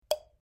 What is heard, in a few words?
cantap